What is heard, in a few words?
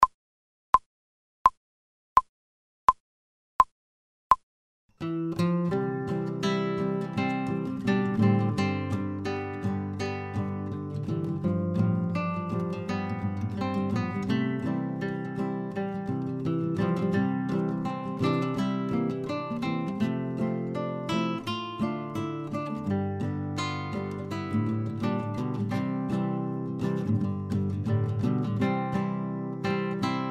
fast, guitar, without metronome